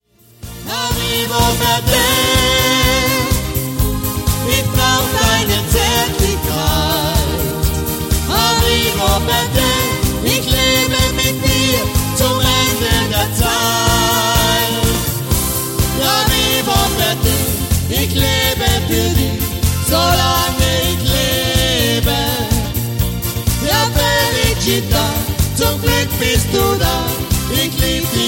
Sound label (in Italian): Duett